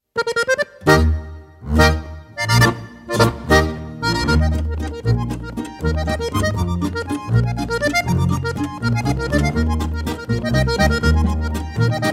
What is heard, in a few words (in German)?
Landler